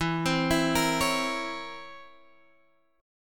C 7th Flat 9th E

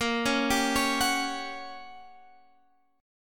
G Minor Major 7th Flat 5th Bb

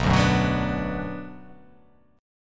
B Minor B

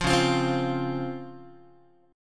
Db Major 7th Db down Guitar; strummed; JGuitar

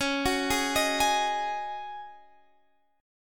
Db Suspended 2nd Suspended 4th, Guitar, Standard; arpeggiated; JGuitar

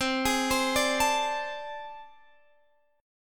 A Minor Major 7th Double Flat 5th

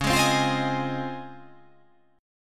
C sharp Major 9th C sharp

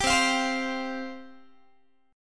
C Suspended 4th C Guitar; Csus4; strummed; 22,20,20,22,21,x; 4A,4E,5A,5D,5Gsharp,6Csharp; chord shape

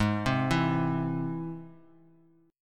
Ab Augmented Ab; Ab+ arpeggiated; JGuitar

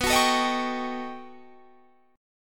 B 7th Flat 13th B, guitar chord

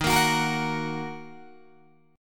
Eb Minor, Guitar, Open D

